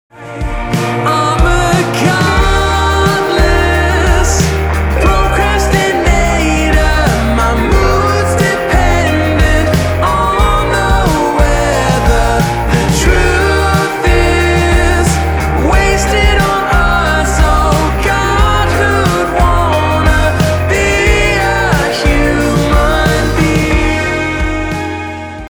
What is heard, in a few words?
Rock Pop Tenor